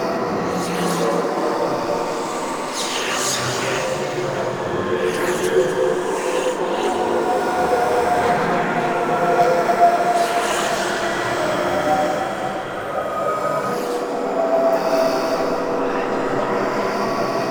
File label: Elevator Loop; Warframe; Tau; Circles Of Hell